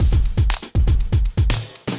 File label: Acoustified Kit, Drums